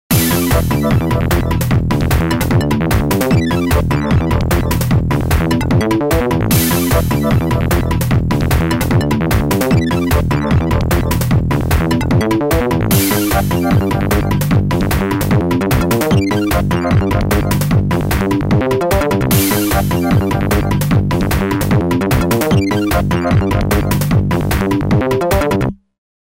Yamaha SMAF demo ringtones; Body and Body

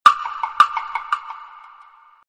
muyu; China Mobile; A4s

